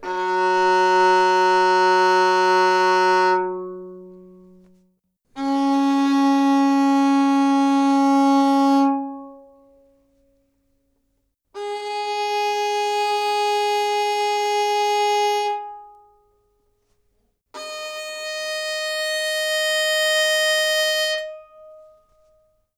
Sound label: Open Strings Baroque Bow Gut B